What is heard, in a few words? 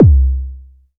kick, Novation Bass Station 2